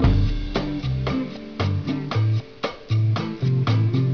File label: comic